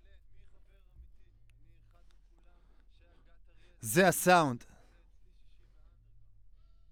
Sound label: Voc OD